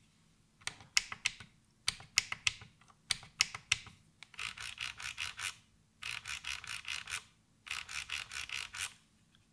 MSI GK71 Sonic Blue Mediakeys

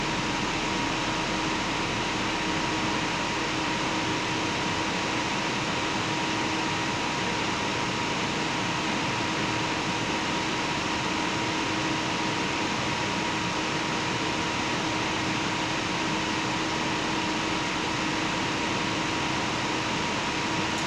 Gaming Noise

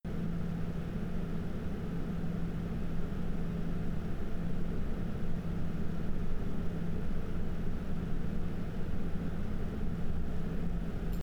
Arctic PST 0dB Max RPM

